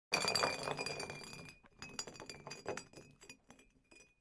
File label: bottle kick; Pitch Black - A Detective's Demise